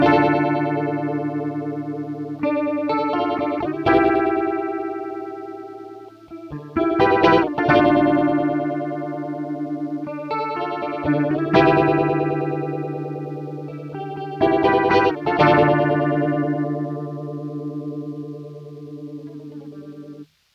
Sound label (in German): Orange Gitarren Effektpedale Phaser High Speed